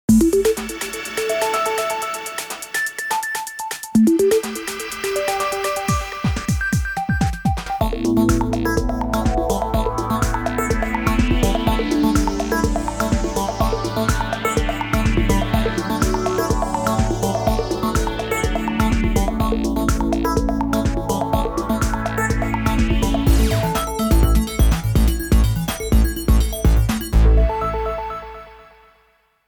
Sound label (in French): Flash Info jingle; Jingles medley